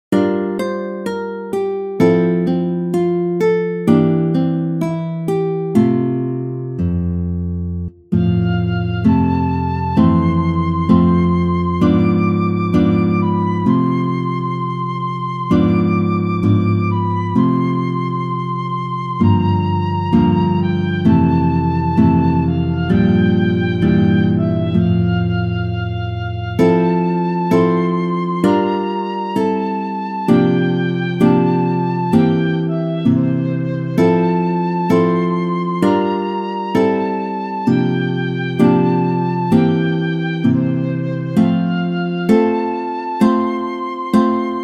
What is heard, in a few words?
flute and guitar; rocking carol